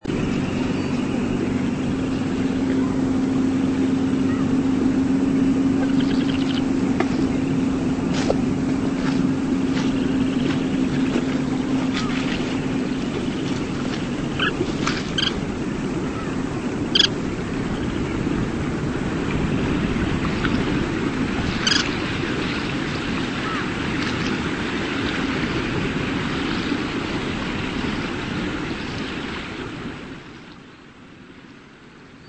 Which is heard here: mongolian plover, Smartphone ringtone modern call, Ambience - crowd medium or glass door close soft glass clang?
mongolian plover